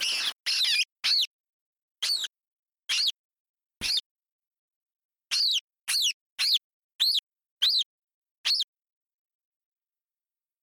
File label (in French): panure a moustaches